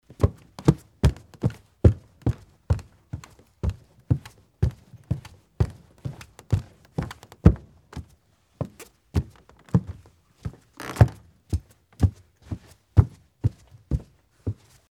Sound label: wood corridor